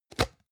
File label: plastic can small lid open hard